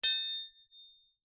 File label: impact metal thin stick hit; zapsplat